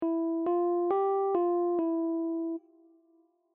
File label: phrygian three notes